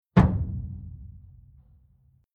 Deep Hollow Metal Impact Stinger Single Thud Drum Errie